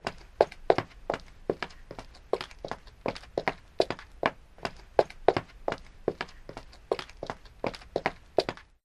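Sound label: Footsteps Female Run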